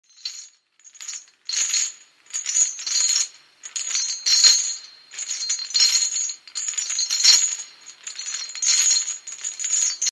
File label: Walking with chain